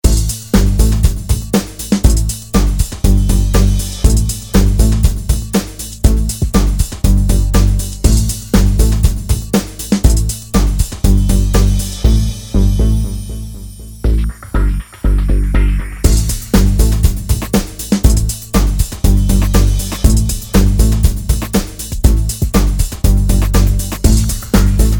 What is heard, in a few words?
Bass Line Runner